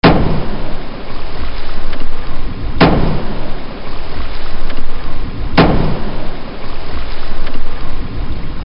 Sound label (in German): 3er Airgun; Kein Laerm Meer